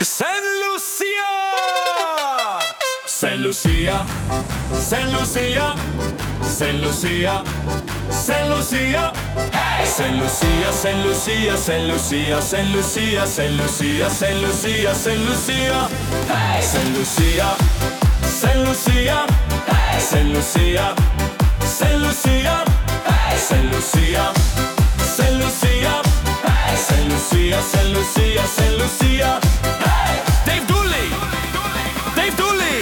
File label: Polka; 50th Birthday